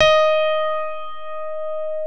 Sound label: CP-70, CP-70 El.Grand; E-MU Producer Series Vol. 2 – More Studio Essentials